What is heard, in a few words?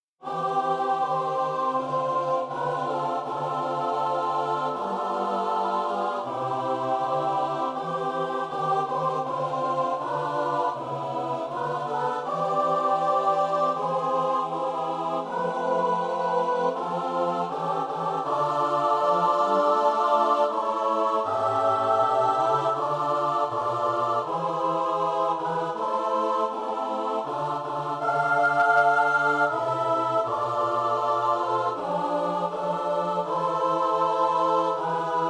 midi; surzynski; ojcze nasz